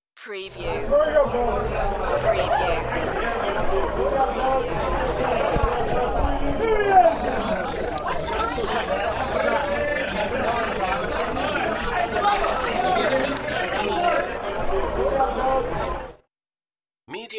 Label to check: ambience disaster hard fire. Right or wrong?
Wrong